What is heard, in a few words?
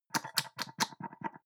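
soap dispenser press multiple almost empty fast, bathroom; haus-garten; badezimmer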